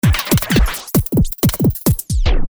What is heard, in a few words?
Backspin